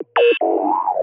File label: out of ammo; Allegiance